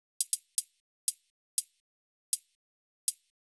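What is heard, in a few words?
Hat Closed; VariBreaks; hydrogen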